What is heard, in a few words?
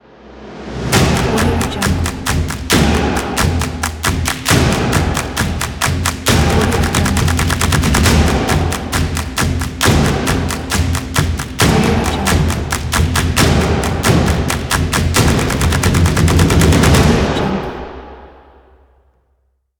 claps and taiko